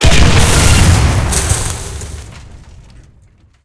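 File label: tank hit high-explosive anti-tank big; heat; sfx tanks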